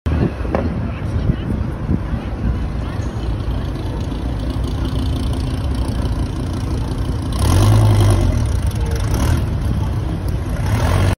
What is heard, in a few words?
Dodge Viper